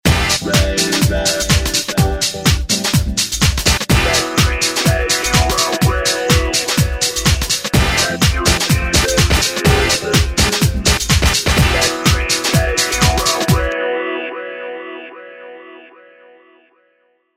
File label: Techno dance; Samsung; GT-S3600